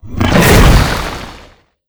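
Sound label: angel warrior attack, monster; lava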